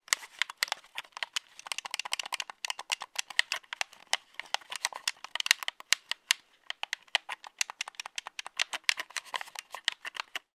video game controller xbox buttons pressing game play, household